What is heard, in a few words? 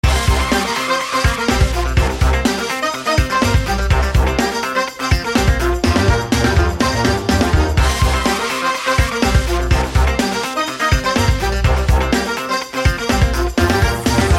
bgm free; yule; cardtiger